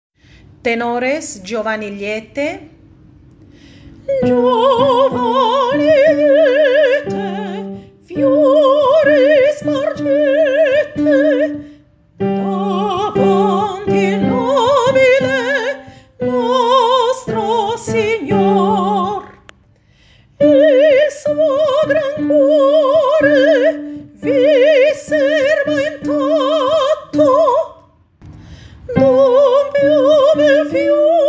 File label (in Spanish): tenor